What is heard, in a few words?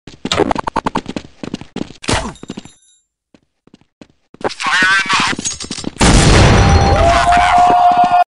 counter strike walk